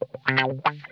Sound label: COARSE RIFF, Funk Guitar; Zero G - Funk Guitar